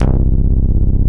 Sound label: SD1 DANCE, synthesizers; Soli-Music; World of Synthesizers VOL-1